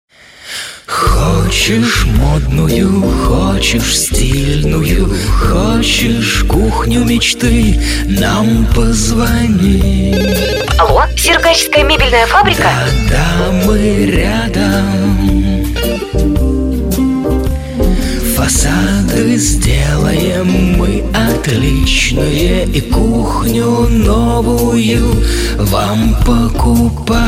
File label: Vocal